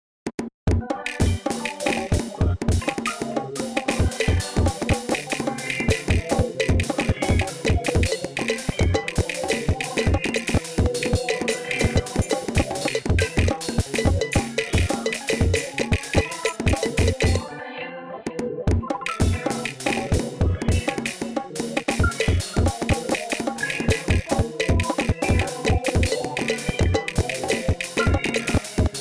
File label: Crash